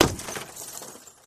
Glass Smash Auto Win

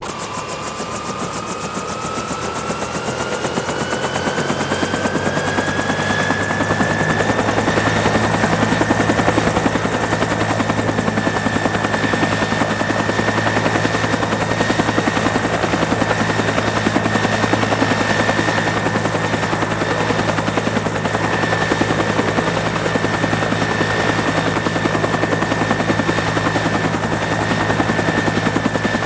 Helicopter Bell 212 Rev Up, Shut Down, Total Stop

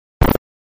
Bullet Time Whip Reve

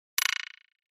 Pop Up HF Elect Tap Tre, pop up element

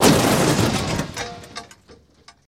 Junk Crash Metal On, crashes

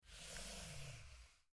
Short Mouse Slides

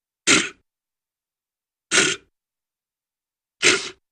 Animal Snorts with Reverberant Quality

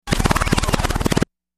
Silenced Machine Gun

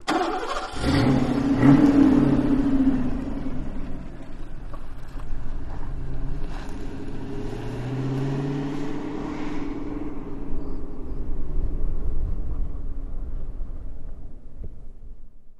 Mustang Start, Rev Away, Wind; Cricket Ambience

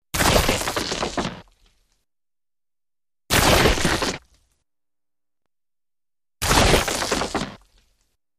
Big Rock Hits 3x; rocks

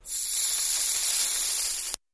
DC Arc Across Knife, arcs & sparks

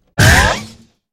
Cartoony Impacts Boing, boings

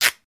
Rip Cloth Small

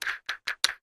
Electric Drill; drills

